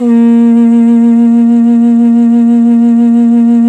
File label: CONCH LOW, World Percussion